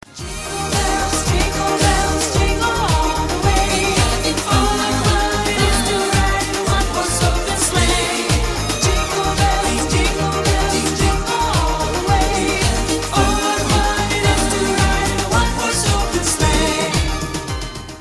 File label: xmas jingle, ringtone